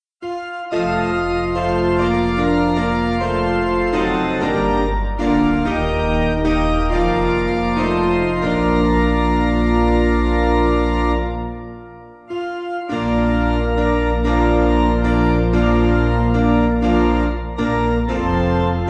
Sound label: piano, hymn